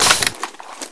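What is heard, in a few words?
crossbow magazine out; sci arms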